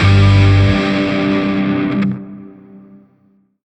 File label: Shawzin Phrygian Chord J; Warframe; Grineer Shawzin